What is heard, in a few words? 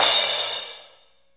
DX CRASH; Crash Cymbal; DMX Cymbal/3 Crash 1 of 4; Oberheim drum sound chips